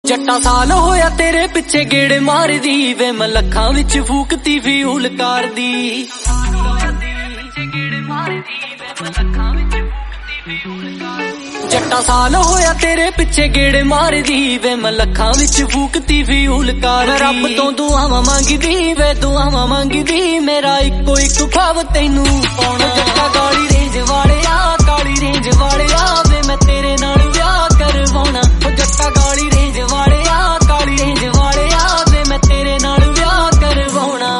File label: Punjabi Song